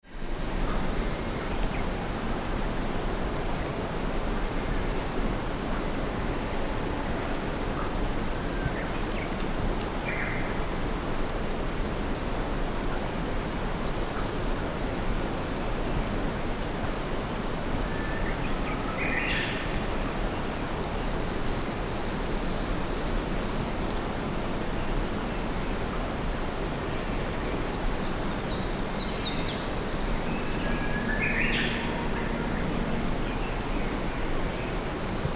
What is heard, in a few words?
morning countryside